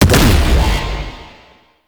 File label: Alt Fire Explosion, Weapons; Warframe; Corpus; Tenet Quanta